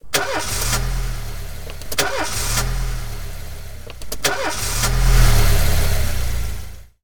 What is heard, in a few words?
Start the Car; this is the police pc